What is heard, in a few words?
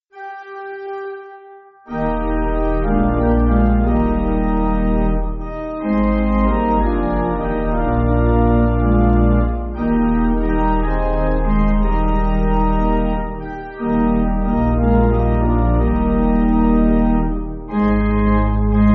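Pipe Organ, church backing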